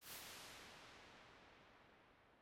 Midiverb Mark2 Preset29

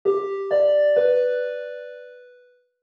dingdong

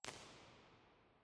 Nuclear Reactor Hall

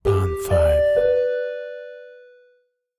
dingdong

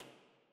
Underground Car Park

